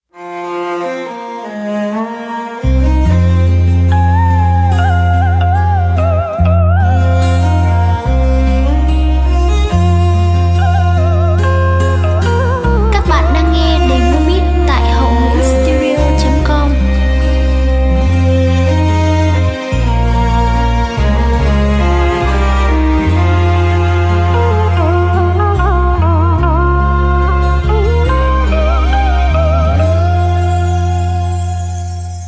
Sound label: beat, playback